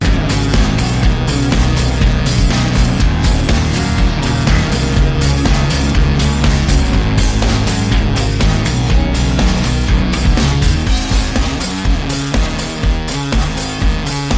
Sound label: instrumental